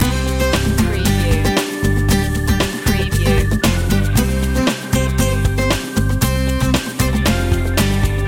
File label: caribbean night loop